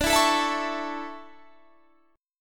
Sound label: D Major 7th Suspended 2nd, Guitar Standard; 22,19,19,21,22,21; DM7sus2 strummed; capo=3